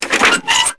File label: open air vent; Max Payne